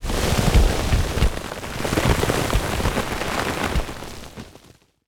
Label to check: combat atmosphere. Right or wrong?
Right